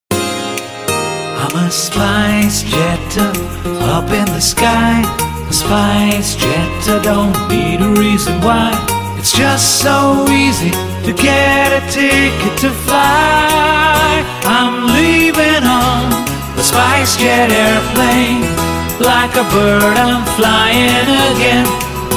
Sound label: Jingle; Spice Jet